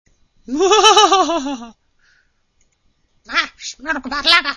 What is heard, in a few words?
laugh